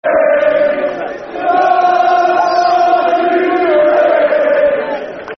Come On You Greens, Football Chants (Soccer Songs); Plymouth Argyle Pafc